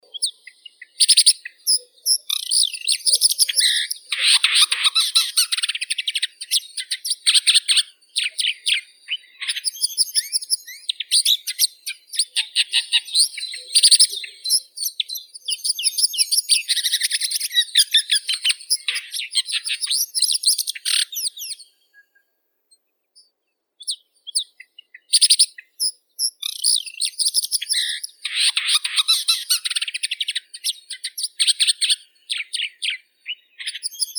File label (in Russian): zhulan obiknovennii lanius collurio, zvuki prirodi